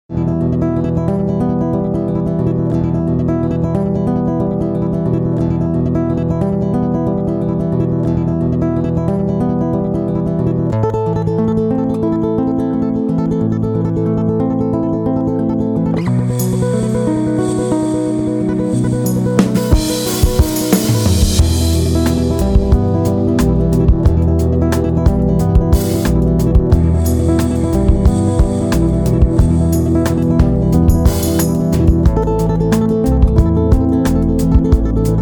Instrumental Mix

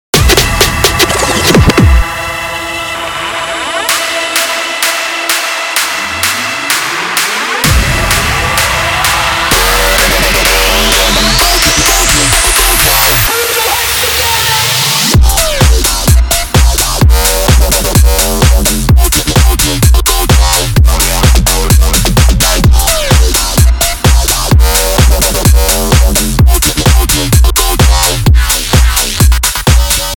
Bass House

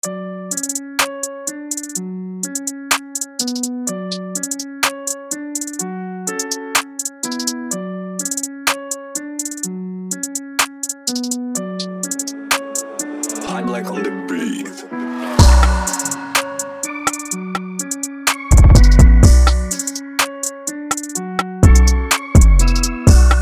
125 BPM

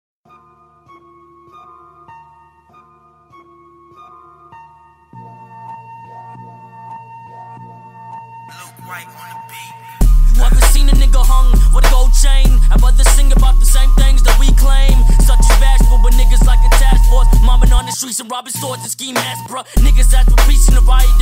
35 37 39hz Low bass

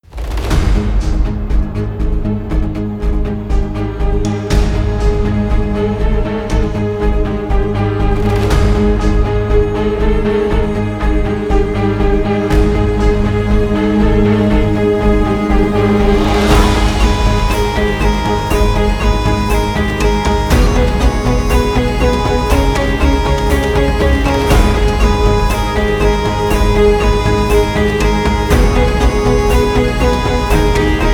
Epic Celtic

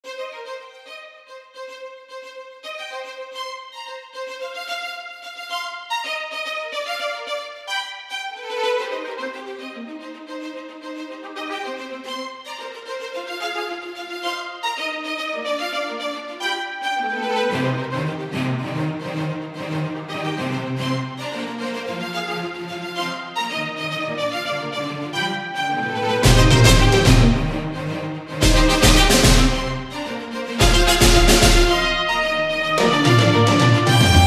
Piano

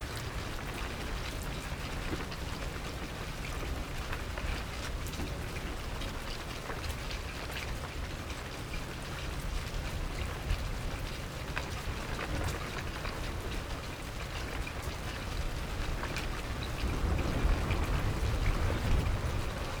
Windy Gentle Rains

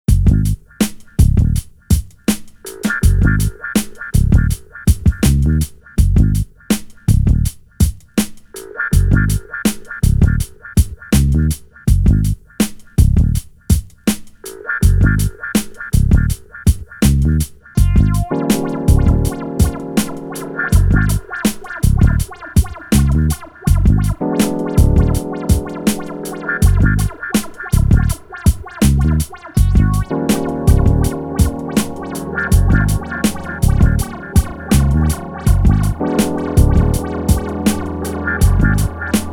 Fuzz

